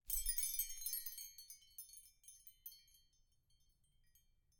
windchimes pacific obsidian beads kelp pods no clapper no windcatcher twine release; gentle; Sonniss GDC